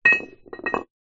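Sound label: Weight Drop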